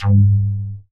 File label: WET BASS; Masterbits; Dance Trax